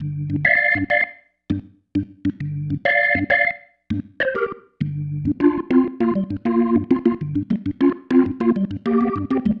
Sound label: Pattern, Organ